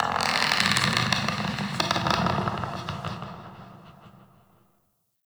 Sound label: Forest Distant Tree Creak; Ambience; Warframe; Grineer